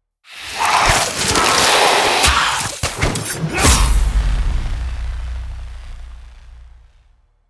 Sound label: multiplier Strike; conan